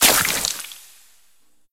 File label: bounce; slimer pad